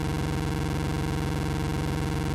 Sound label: Racing Engine Loop